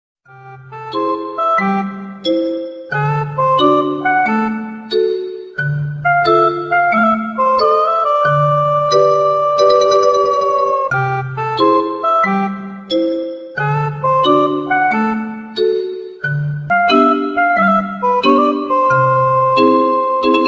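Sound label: background music ringtone; Shin Chan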